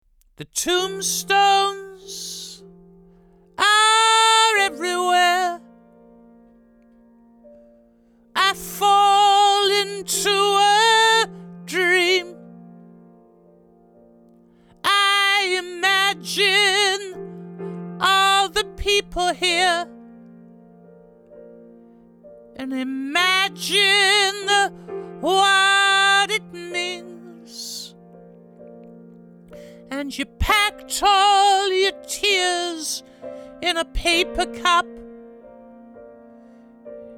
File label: Vocals 240 Hz boost 5 240 Hz atten 7 5 kHz boost 7 20 kHz atten 7